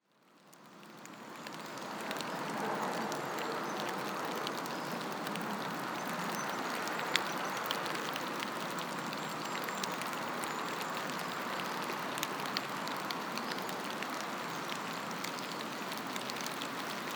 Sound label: Fforest Rain